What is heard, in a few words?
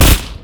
spray and pray; LoL; gangster twitch